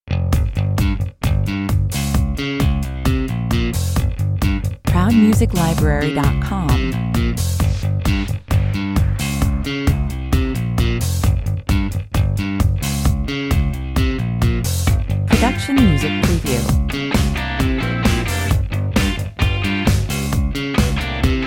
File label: dirty disco